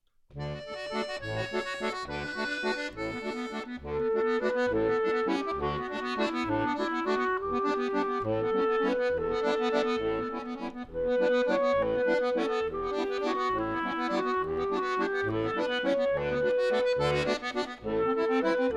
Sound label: playbacks; barouf; atelier; barouf au musee